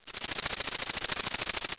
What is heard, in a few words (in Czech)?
cikada